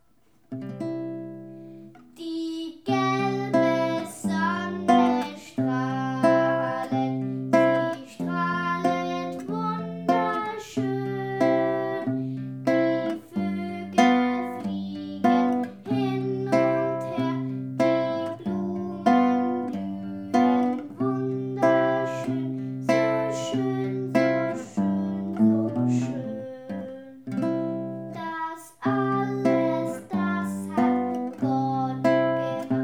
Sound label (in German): Christliche Volksmusik Lied